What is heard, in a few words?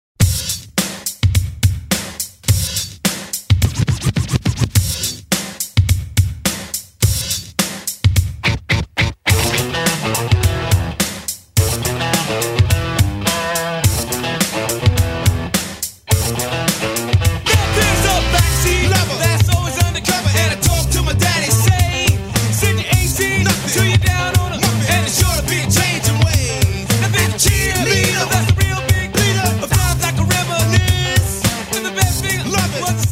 polyphonic